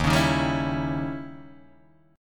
D sharp 7th Flat 9th, D sharp, down, Guitar, Open D